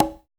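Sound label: jungle conga; Drums In Your FACE!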